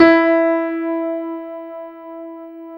PIA MICRS, MICRO-PIANO, Roland; Sound & Vision - Gigapack II

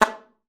SLPA A3, ALTOSAX; Xsample VOL-2

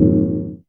LO BELL; Back In Time Records; Big Bang; TDP+BELLS2

